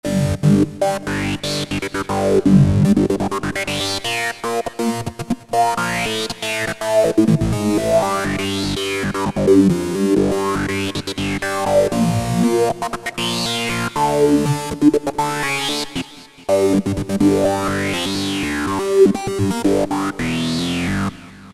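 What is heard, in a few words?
Bass Techline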